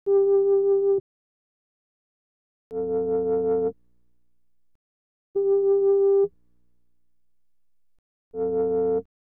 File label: syn G, Hip Hop & RnB; Makin Moves